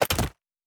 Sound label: Build Interface; Survival Game Sounds - Lite Edition